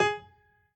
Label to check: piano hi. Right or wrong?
Right